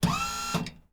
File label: Electric Lift; 99Sounds; The Warehouse